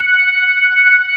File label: MID SLOW F#5, ToneWheel Organ; E-MU Formula 4000 Series Vol. 3; Analog Odyssey